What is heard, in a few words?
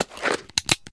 Gun Draw Select Pistol, Weapons Foley; SFX Kit